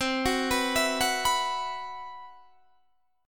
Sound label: C Major 7th Flat 5th, Guitar DADGAD, chord